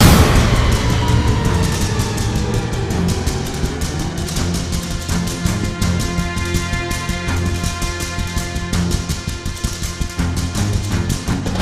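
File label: full orchestra breakdown loop; DECAGON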